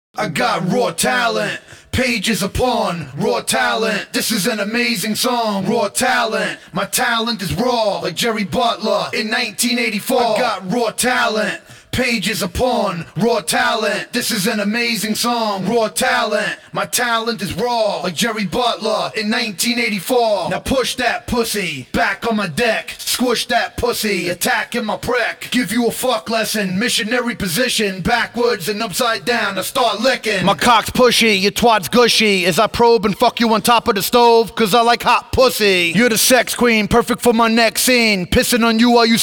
acapella version

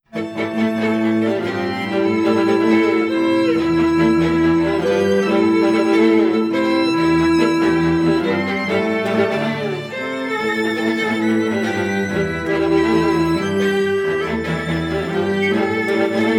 bass clarinet and string quartet

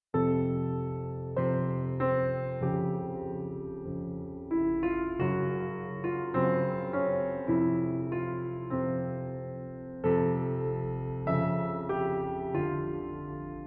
solo piano version

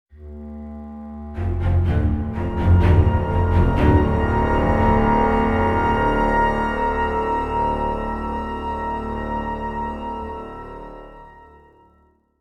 magical trailers transition; sound adventures

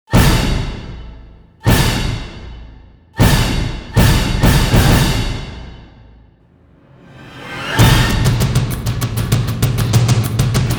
drums

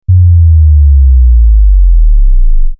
clean dive; Ninja Tracks

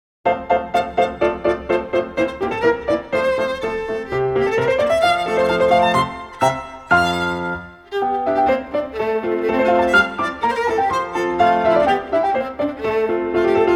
arr for piano and violin; quadrilha

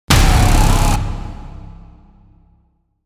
screaming hit big

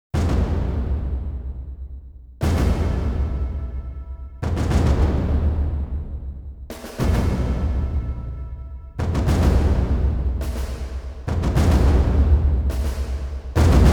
percussion only

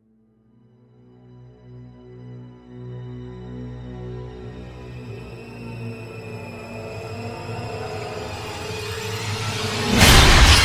horror sting

